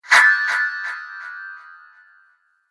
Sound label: Chime Whoosh; Sound Design; Whoosh Sound Effects